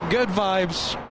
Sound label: drop good vibes